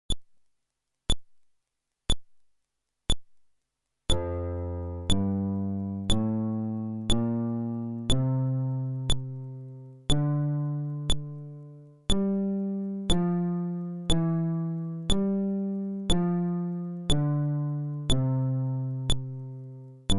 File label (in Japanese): フレットレス